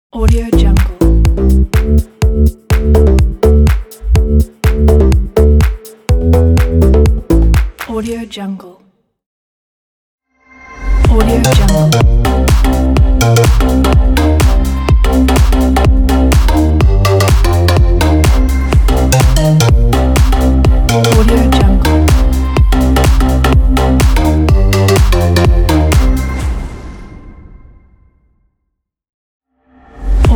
dance logo pack; sawtooz

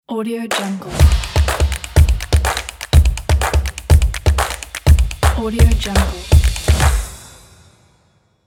fast logo

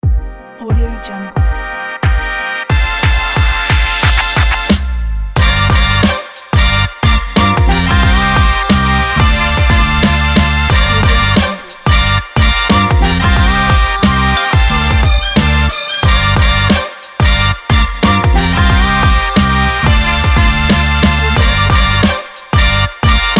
background fashion hip hop

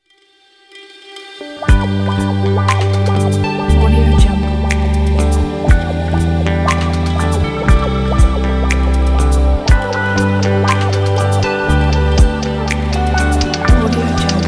calm guitar ident

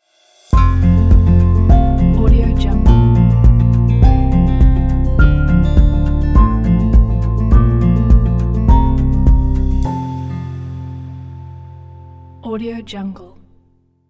acoustic ident

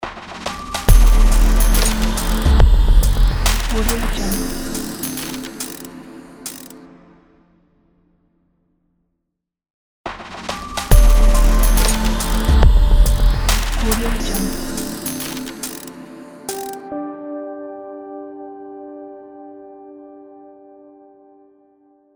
abstract glitch logo

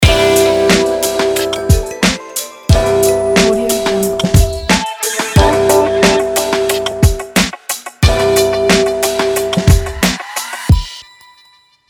podcast opener logo

